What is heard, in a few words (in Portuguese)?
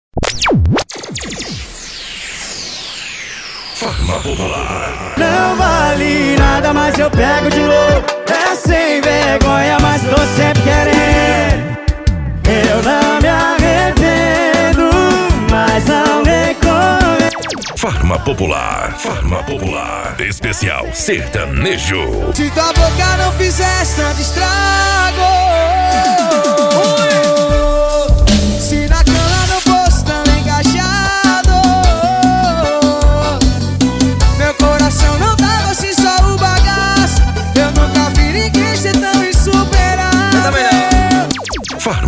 sertanejo; rogaria mais popular; farma popular